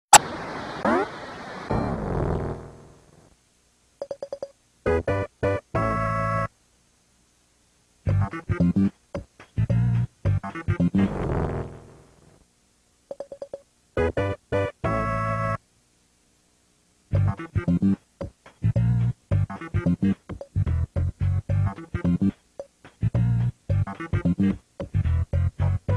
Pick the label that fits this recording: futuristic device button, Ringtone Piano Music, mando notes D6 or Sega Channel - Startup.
Sega Channel - Startup